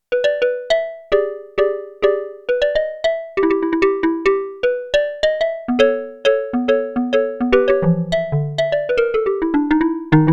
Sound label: Kalimba, Roland JX-8P